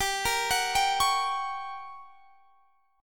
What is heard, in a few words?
G Minor Major 7th Flat 5th, G, down; GmM7b5; arpeggiated